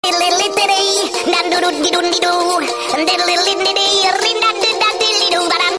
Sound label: Babysong, funny ringtones